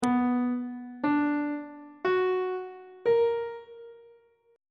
c flat minor major 7th chord on treble clef

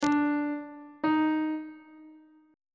e double flat 1st intervals on treble clef

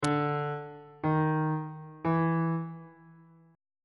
a sharp 4th intervals on bass clef

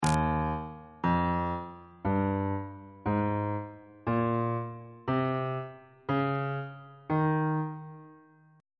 e flat mixolydian mode

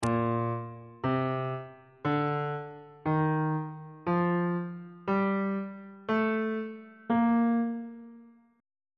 b flat ionian mode on bass clef

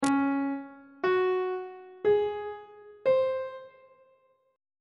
c sharp major 7th suspended 4th chord intervals; treble clef

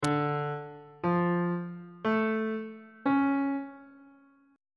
d minor major 7th chord intervals on bass clef

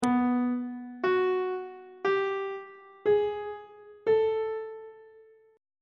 c flat 6th interval pitches on treble clef

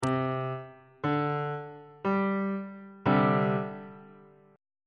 c suspended 2nd chord on bass clef

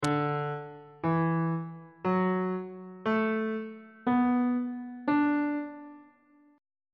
d major pentatonic scale on bass clef

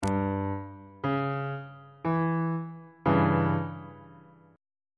d flat diminished 2nd inversion

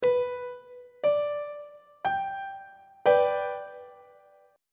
f double sharp major 1st inversion on treble clef

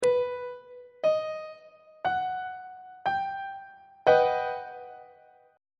a double flat augmented major 7th 1st inversion; treble clef

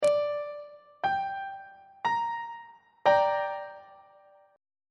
f double sharp minor 2nd inversion on treble clef

